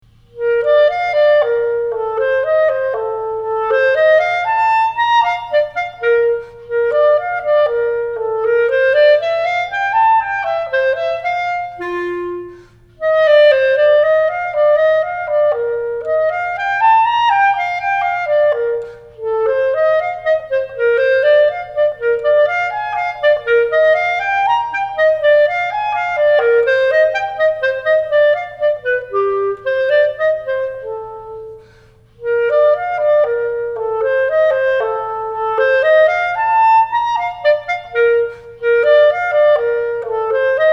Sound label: Clarinet Middle School